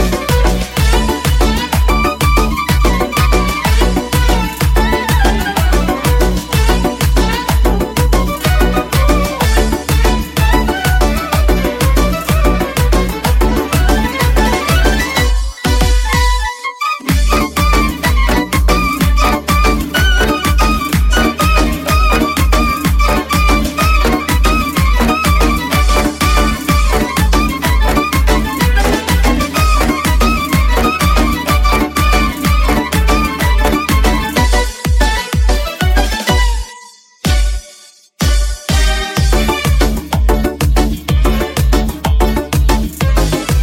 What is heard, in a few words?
Remix